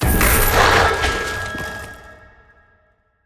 medium Win; netent

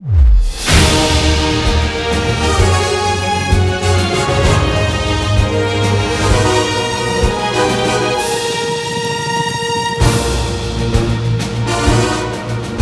Big Win Medium; netent

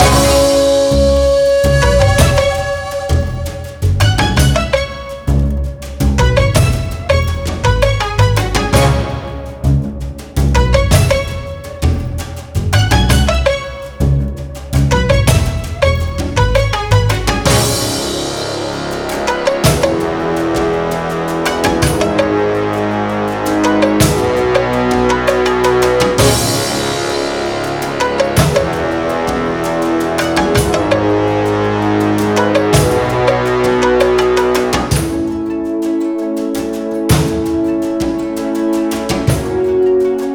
Free Spin Music; netent

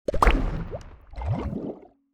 win explosion small; netent

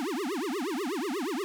fs gobb fly; netent

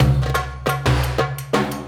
spingle Drum Loop; netent

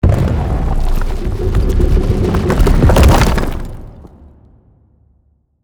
bonus Bomb; netent

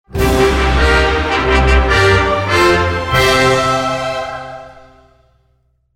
large win; netent

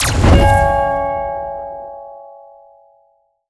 bonus Scatter; netent

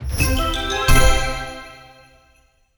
Small Win

